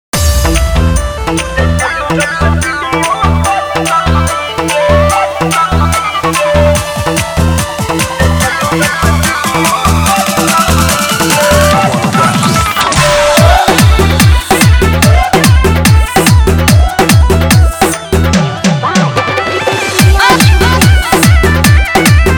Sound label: Bhojpuri Remix Song